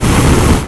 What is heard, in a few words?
flamer; cdogs